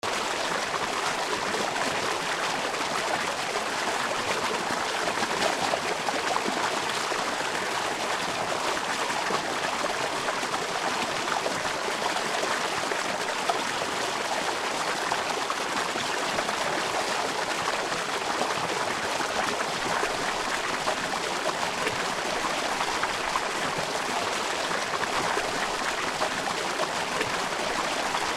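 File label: atmo arizona sedona canyon stream; call of the canyon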